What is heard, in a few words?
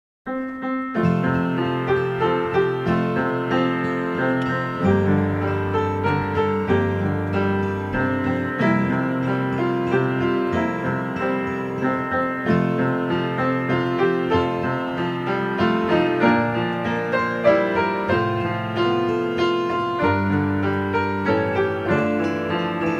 guitar piano Hymn